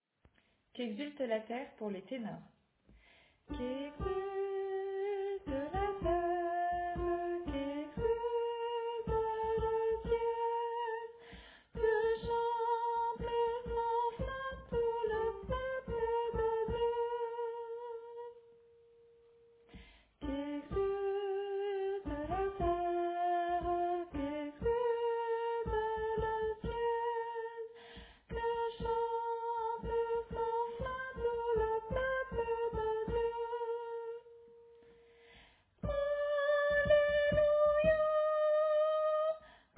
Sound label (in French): Tenor